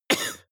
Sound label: cough male single soft short; soundpool